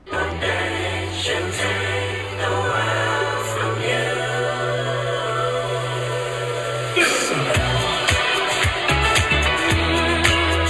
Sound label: Heavy California Stereo